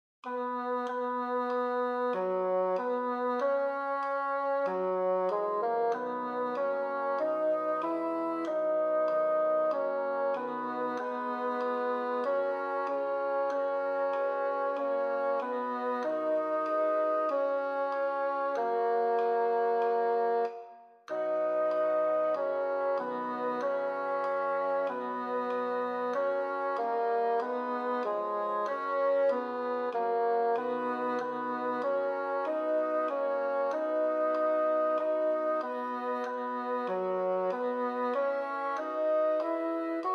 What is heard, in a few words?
Adeste Tenor I